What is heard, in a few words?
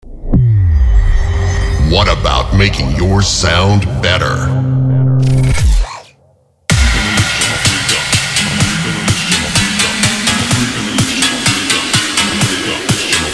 g house; W A Production; Outbreak; serum; ableton